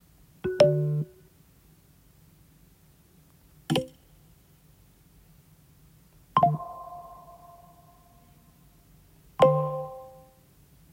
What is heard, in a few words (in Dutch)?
iOS 17 meldingstonen